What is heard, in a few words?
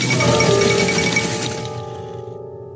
news event notification; hoi4